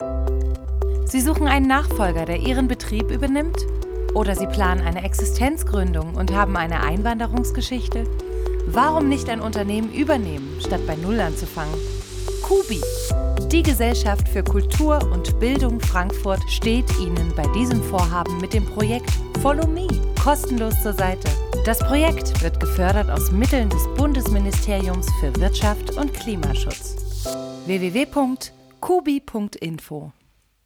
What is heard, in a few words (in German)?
Jingle; KUBI-Projekt follow mi